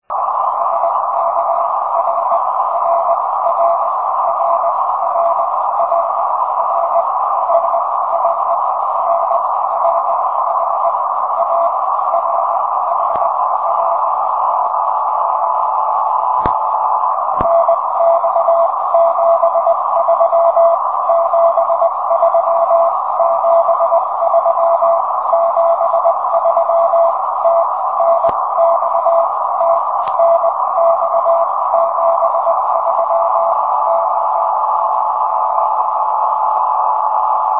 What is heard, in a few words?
2m EME; I2FAK